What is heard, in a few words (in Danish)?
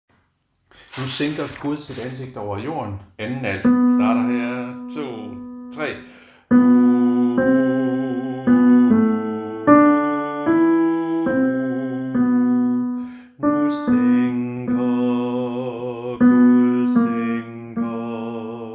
alt, harlev koret; Nu sænker gud